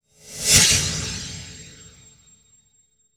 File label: Flash Frame Heavy; Title Sequence Effects